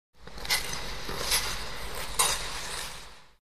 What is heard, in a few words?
Ice Skating Precise